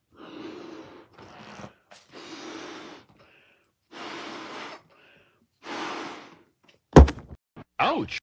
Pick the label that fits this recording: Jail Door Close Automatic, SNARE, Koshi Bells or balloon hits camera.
balloon hits camera